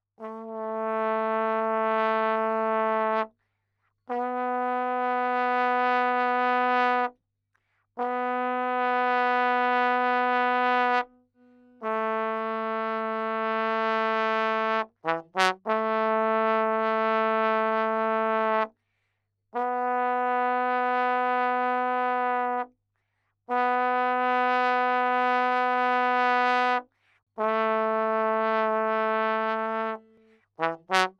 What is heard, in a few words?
trombone